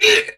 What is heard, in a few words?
Pain; Warframe; Dax Knight; Battle Barks